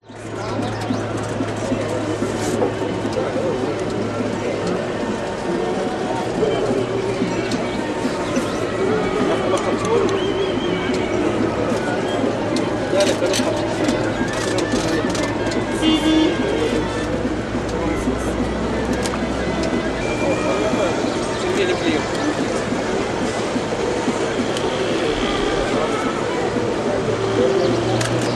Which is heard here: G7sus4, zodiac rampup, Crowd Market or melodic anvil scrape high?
Crowd Market